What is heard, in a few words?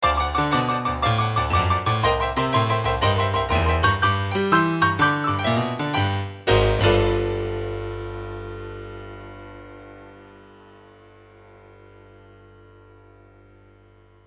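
sounds with an effect of completion, ending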